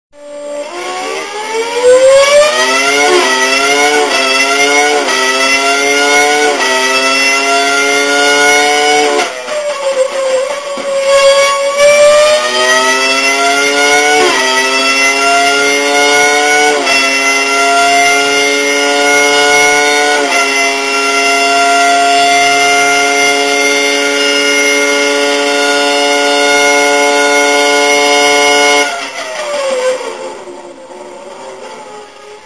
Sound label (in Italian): motore ferrari